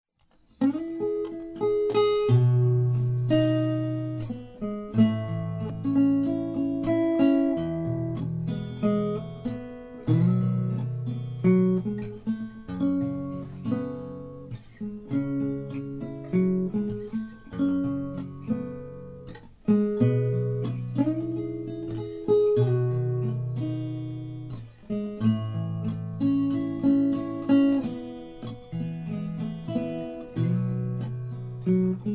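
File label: Helicopters, instrumentals for banjo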